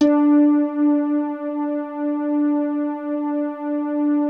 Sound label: HEAVEN D3, SYNTH PLUCK; Music Store - Claudius Diemeter Schwerlos